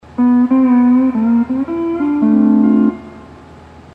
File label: hawaiian piece